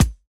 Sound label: BD; Best Service; Dance Mega Drums